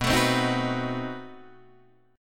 B 7th Flat 9th B Guitar Open D

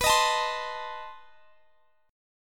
B 7th Flat 9th, down, Guitar, Drop D

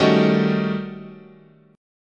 D sharp 7th Sharp 9th Flat 5th, chord

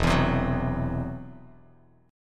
E Suspended 2nd Sharp 5th E down Bass Standard; Esus2#5 strummed

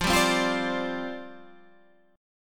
F 6th Suspended 2nd F, chord

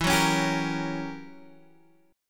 Gb 7th Flat 9th E, guitar

